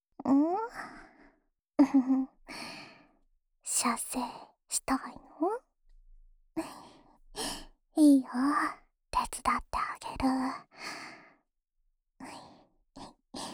オナサポ用浅い耳舐め（右耳）; ASMR

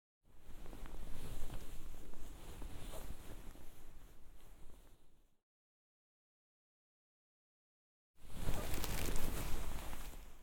もっとキレイにお耳ぺろぺろ, ASMR

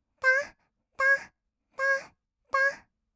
たったっ, kawaii voice; ASMR; 合いの手; 1200 kawaii voice!!

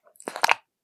くちゅ音, ASMR

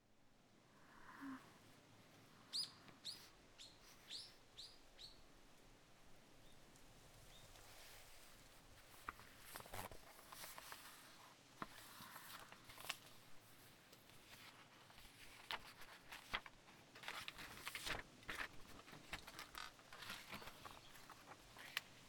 居眠りする書き物作業＆風の音、鳥が鳴く声 セリフなし

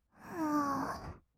"はぁ", kawaii voice; 1200 kawaii voice!!; 色々なワンショット

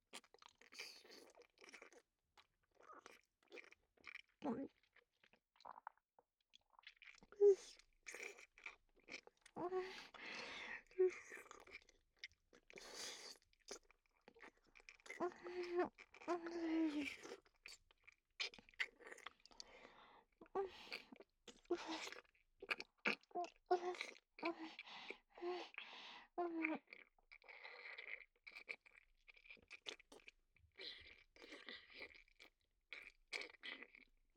唾液 啜り ながら の ごっくん 甘め フェラ

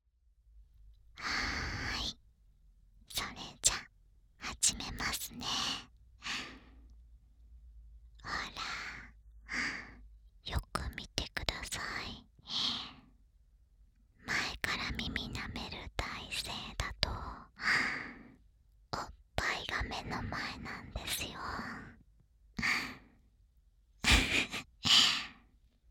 極・耳舐めパンツ手コキ(左耳用); ASMR

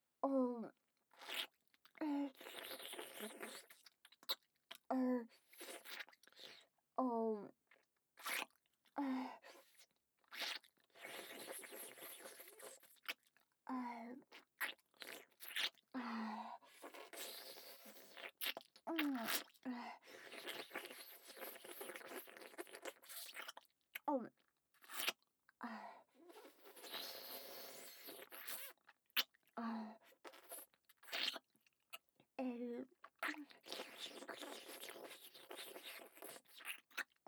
フェラ ゆっくり, ASMR

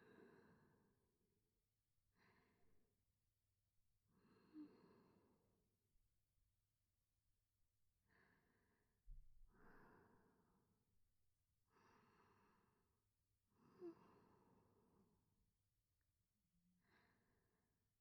寝息（正面）; ASMR

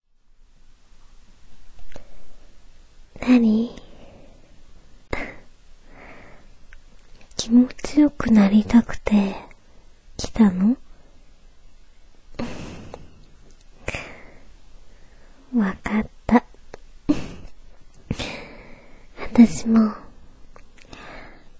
フェラ させて ザーメンも飲んでくれる 女の子; 100円でフェラさせてザーメンも飲んでくれる女の子

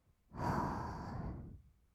吐く音, ブレス; ASMR; 1200 kawaii voice!!

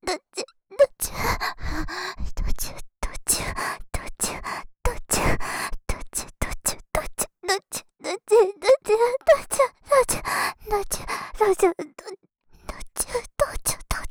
どちゅどちゅ, ASMR

